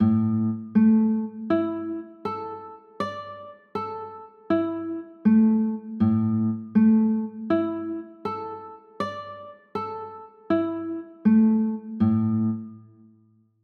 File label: a sus4, chords